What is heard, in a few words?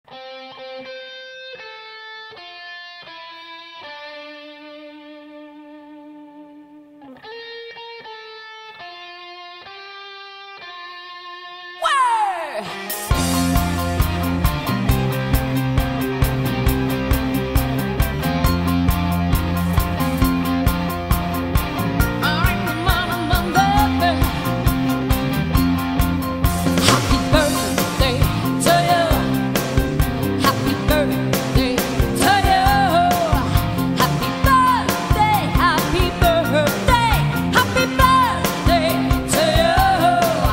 Rock Happy Birthday Song